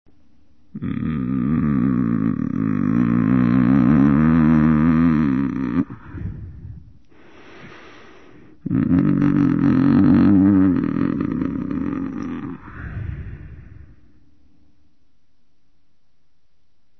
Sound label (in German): brummen